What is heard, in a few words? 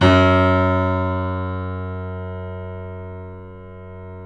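piano, F#, left; InVision Interactive; The Piano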